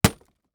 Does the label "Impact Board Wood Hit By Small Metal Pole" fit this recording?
Yes